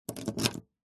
vehicles car key insert lock ext 4x4; zapsplat

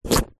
carrot slice knife; zapsplat

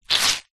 wet cloth squeeze rinse

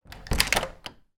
household wooden door internal handle turn modern

